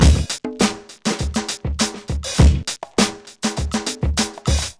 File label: BONGO CITY; Zero-G; Funky Elements